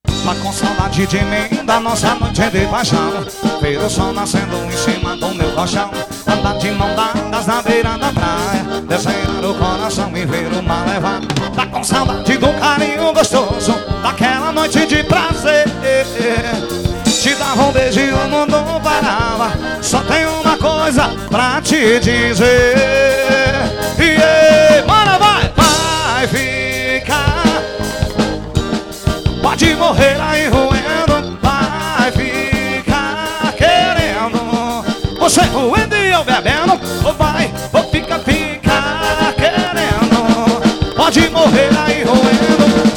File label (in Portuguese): sanfoneiro vocal